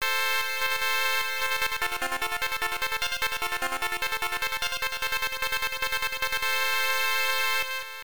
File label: Home Run BGM; The Pro Baseball Clash Pennant Race; MSX2 SCC